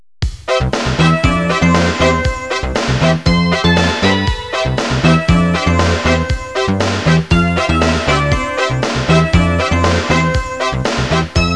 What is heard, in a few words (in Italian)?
suonerie businessy